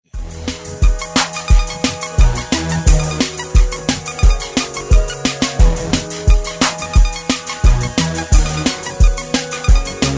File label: Very Nice Tune